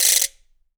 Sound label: RATCHET; Big Fish Audio; Ross Garfield Drums VOL-2